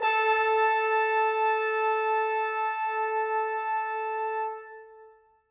Horns Trumpets, Orchestral; Sonic Foundry (Sony Creative Software); Orchestral VOL-2; Modern 09

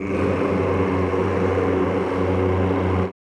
CHO F#1, Rrrr; Sound & Vision; Gigapack I CD 2; CHO Mmhh + Rrrr

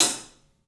PAHAT, 3D Drums, LITE CLUB HT; 3D Sound

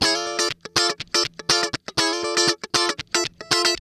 Guitar Licks

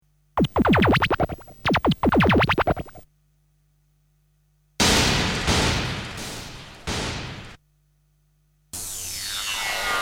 Sound Effects; Kniteforce; 1000 Samples Volume 1

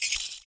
FOOT PERC; Univers Sons - Raricussions

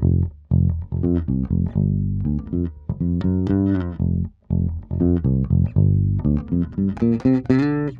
Bass 120bpm Cm, Nu Disco; Zero-G; Construction Kits; Legacy

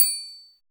DEL TRI OP, Drums; FILM KIT; Drums In Your FACE!

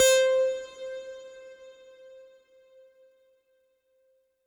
Synth C, Synth Prophet One Shots; Electronisounds

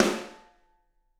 SD, Drums; Bob Clearmountain Drums I

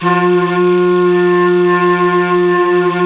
flute vox, singin' flute; FLT; Jazz; Roland L-CDX-03 Disk 1; Jazz+Singin'

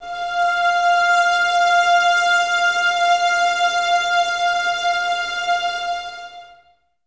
VS F 5, 11 Violins, Mute; Miroslav Vitous; String Ensembles

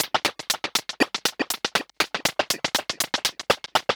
Modular Noise Rhythm (120BPM)

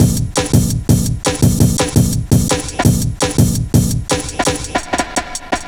Old School, Jungle, Drum Loops 168.5; Zero-G Jungle Warfare 1, 2 & 3

